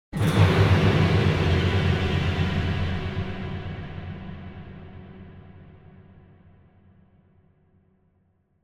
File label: Symphonic Hit In Danger - Strings and Drums; Hollywood Edge; sound designer's toolkit v2